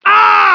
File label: damage